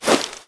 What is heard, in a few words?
grenade throw; valve